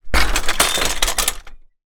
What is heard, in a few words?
transport bicycle impact crash hit fall over